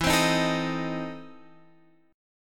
E Major 7th Suspended 4th Sharp 5th, Guitar, Open F; EM7sus4#5 strummed